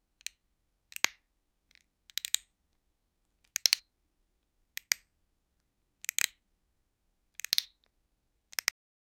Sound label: click sound of two lego